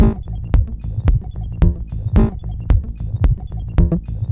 111 svank-computer-mudhouse-lofit, synth and electronic loops